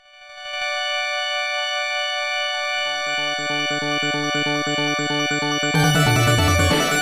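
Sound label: background music; Mega Twins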